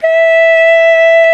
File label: VOX, Male Scats